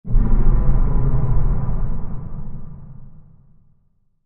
Music Eerie Low Flan

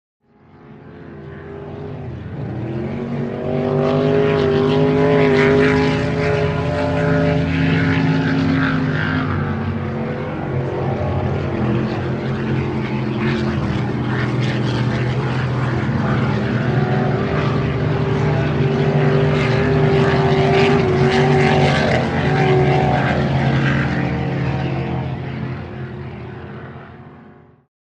Power Boats By Fast C, off shore power boat

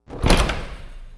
Door, Metallic, Close with Automatic Closer

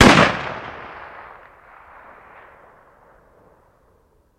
Ak47 Sngl Shot

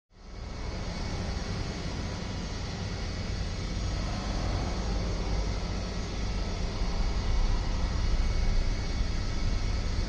Ambience Cargo Bay

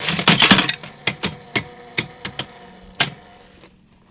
Slot Machine Lever Ru

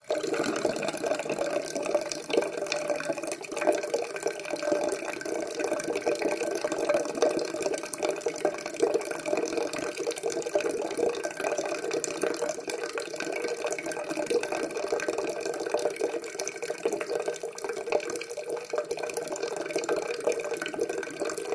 Wash Basin Trickle

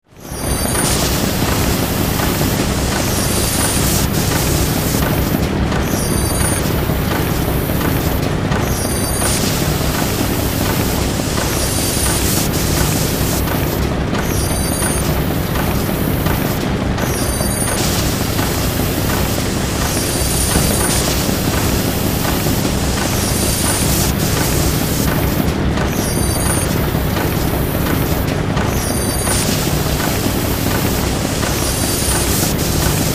Factory Background, factory

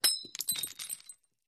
Glass Impacts Debris; windows & mirrors

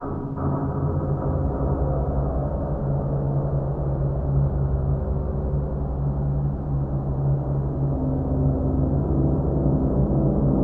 Coil Echoing Metallic Hit

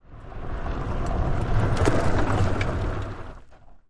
Car Tires Dirt Skid; tires on dirt

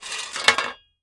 Shovel Drop Metal On

